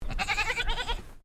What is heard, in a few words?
Animal Goat Toggenburg Baa; Animals from Edgar's Mission farm in Victoria; Goat Sound Effects; Goats (Sound Effects:Animals); Foundation1